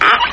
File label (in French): porte